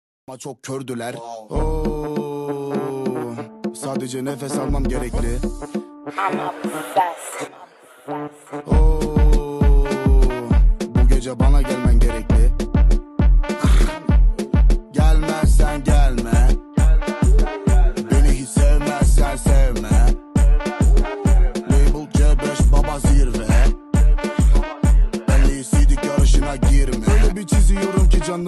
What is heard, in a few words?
bass